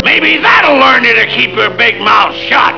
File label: mouth